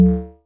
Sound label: Tom Gong, Yamaha DTXpress Electric Tom; drum machine